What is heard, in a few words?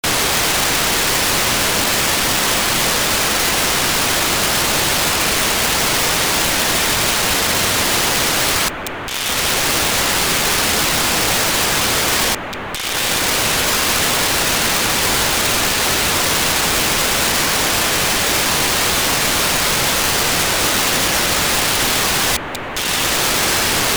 signal; SiriusSat